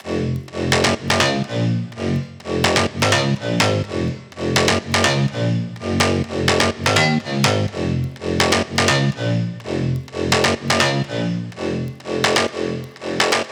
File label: Bass WET SC, Future House 125 BPM A; Big EDM; Future House Tools; Construction Kits; Pump